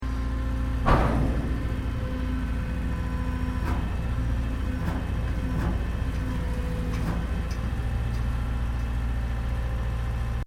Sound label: Boat Ferry Car Departing; Wisemans Ferry Nsw; Boats (Sound Effects:Vehicles)